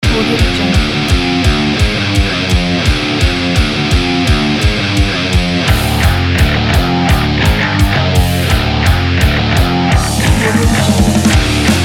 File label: aggressive heavy metal